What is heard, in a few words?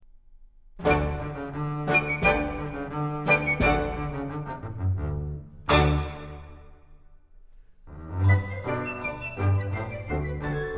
Ragtime Orchestra